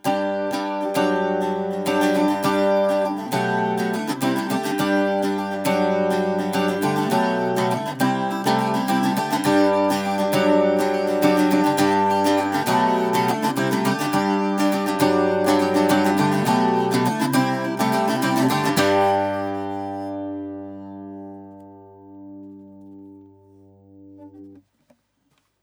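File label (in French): bouzouki gauche schoeps cmc5 mk4; audient mico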